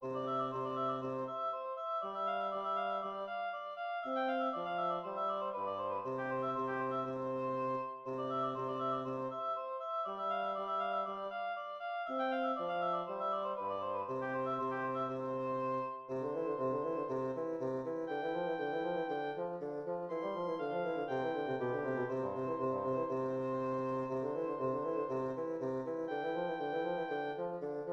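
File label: allegro oboe bassoon duet; haessler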